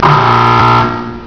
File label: QUARTER, SPORTS